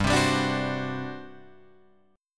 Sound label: G Major 7th Suspended 4th, G, down, Guitar, Open F; GM7sus4; strummed